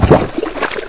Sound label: birth; video games; source engine; hl1; gonarch